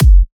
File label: Monster Kick, Drums, Kicks